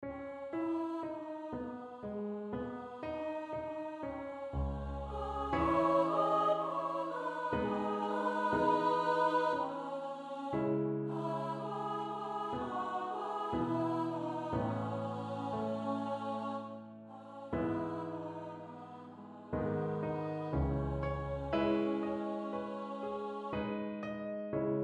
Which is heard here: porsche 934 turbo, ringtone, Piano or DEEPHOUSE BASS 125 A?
Piano